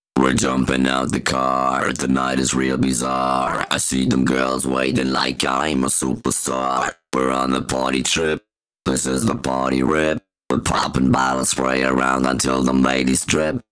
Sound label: Pitched Vocals DRY, 140 BPM; Vengeance Samples; Song Kits